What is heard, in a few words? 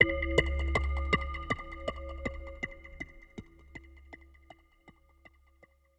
AMBNT 160; eJay; Ambient